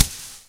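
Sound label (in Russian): звуки барабана издаваемые стуком по коробкам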